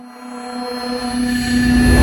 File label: flashback riser